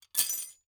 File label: ui drop metal; Rust